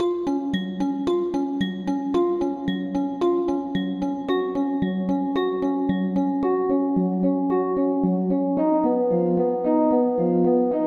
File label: Mallet Reed